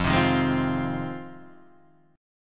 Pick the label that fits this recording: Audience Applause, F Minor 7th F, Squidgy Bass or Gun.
F Minor 7th F